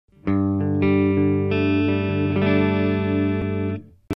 AKG C3000 Clean (Neck), guitar

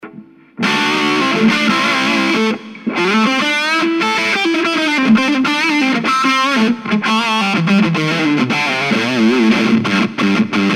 reference x-treme tone lead

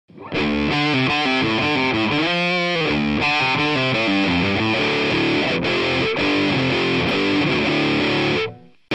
Electro-Voice Cobalt7 - Gain (Bridge)

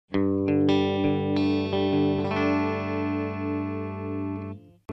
Audio-Technica ATM63 Clean (Bridge Neck), guitar

